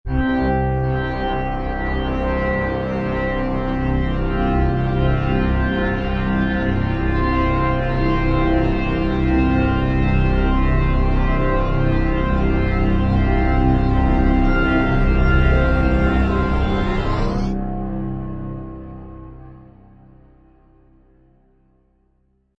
Ramp Up, Sound design intro; Bumpers; Royalty Free Bumpers Music

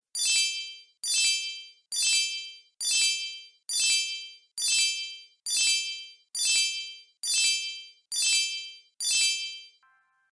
Searching, transition sound effects

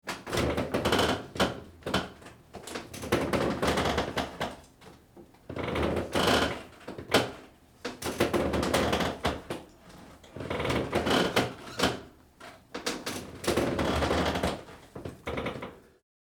Wood Creaks; Low , Heavy Wood Creaks; Creaking; Objects

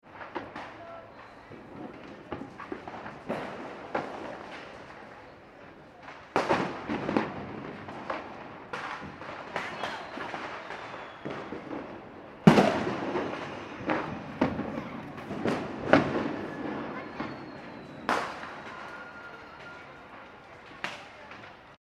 fireworks street urban; Fireworks ( Domestic ) Being Let Of In City / Town Centre; Fourth of July; McKinneySound

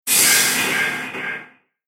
Robotic Blade Echoes; Robot Sound Effects; Sci-Fi Sound Effect

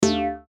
button bleep; Multimedia; Buttons

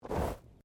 Body movement Slide; Body movement foley to simulate falls and deaths; Body Sound Effects; Bodies (Sound Effects:Human)

